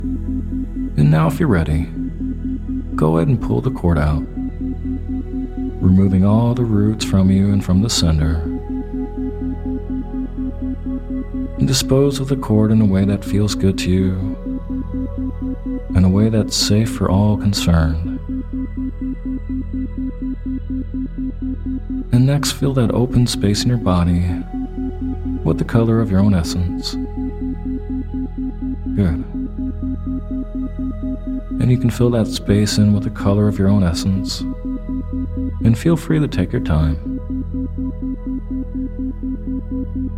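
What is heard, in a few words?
Cord Cutting, Isochronic Tones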